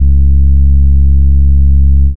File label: Sub Bass